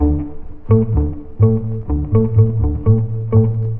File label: guitar chunky